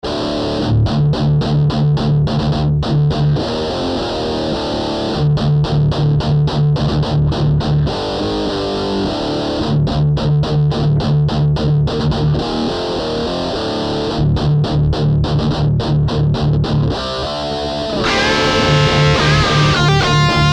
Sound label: High Gain